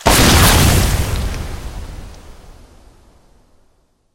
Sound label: bloater explode; infestation; Half Life Alyx; xen